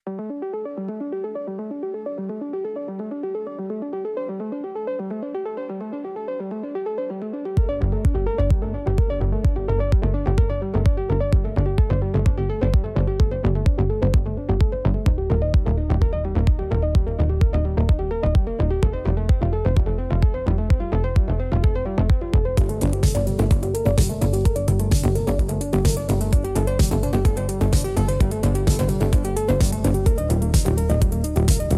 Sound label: Electronic Impulse